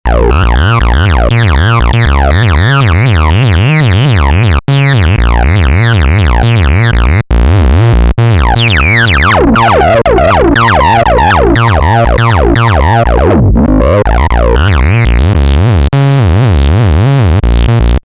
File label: TB Bass